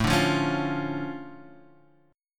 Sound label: D Minor Major 7th, A, guitar